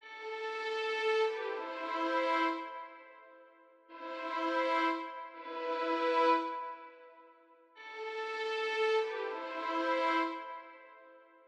124 BPM A Min Strings; Trap Melody

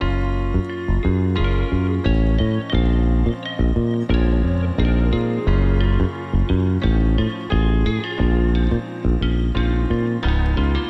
Classic Melody Loop - 88 BPM A# Min; Cymatics; Oracle Sample Pack

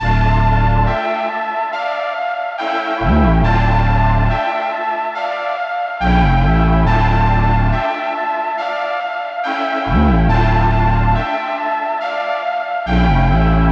140 BPM D Min, Hip Hop Melodies, Drill; Merciless

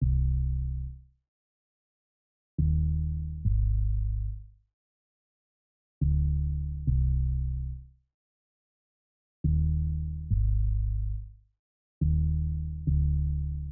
140 BPM C Min Bass, Loop Stems; Melodies; Cymatics; 2022 Melody Mystery Expansion; No Loyalty

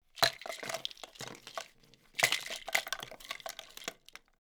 Ice Cubes Dropped in Glass; Fairlight Sound Lib

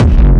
Techno Kick; 30 Techno Kicks 2025; Ghosthack

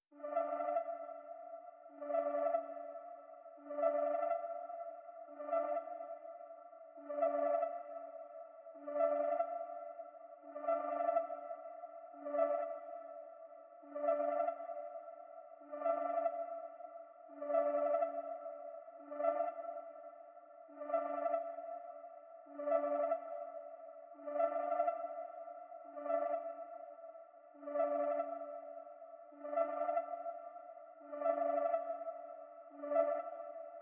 140 BPM D Min Synth, Drill; Melodies; Loop Stems; Armed

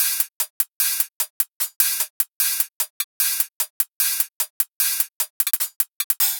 Hi-Hat Loop 150; Dubstep; 150bpm; Ghosthack; Dubstep Sound Arsenal; Hi-Hat Loops

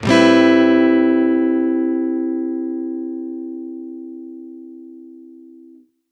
Guitar One Shots A9min; Ghosthack; Guitar Loops & Shots

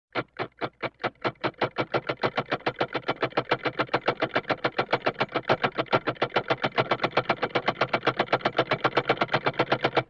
Comedy Motor, Vintage Cartoon Foley

